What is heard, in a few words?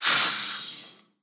steam rush, air